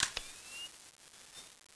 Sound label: nvg on